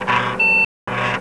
boot; SoftPC Classic; SoftPC Demo

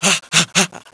burn, blade; SINDEMO; player

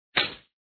drop

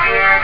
One Synth

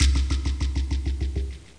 menu Ok; Civilization II

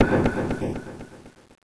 Wokka wokka; Macworld (1997-09); Games World; Shareware Games; Noah!™ 1.0

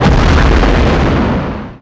large explosion; Incoming Forces; explosions

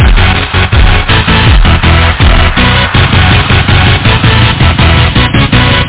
CHORD

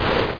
spike

